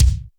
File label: BD